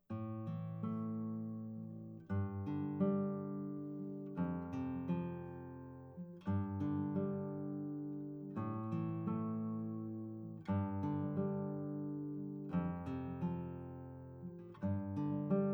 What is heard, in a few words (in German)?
Walzer fade out